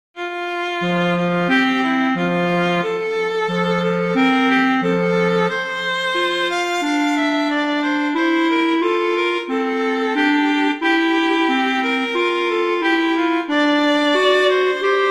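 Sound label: Duet, horn; Mozart; Duets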